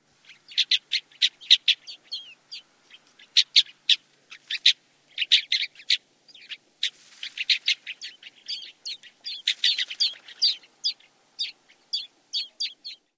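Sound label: Black Rosy-Finch calls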